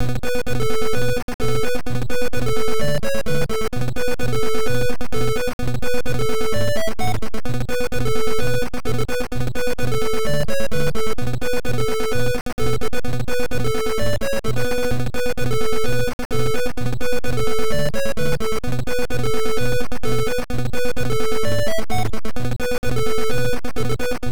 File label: Fugue; The Panda Prince; NES